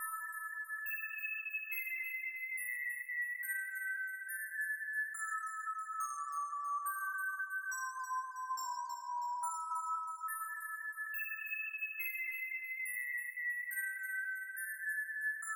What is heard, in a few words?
hollow place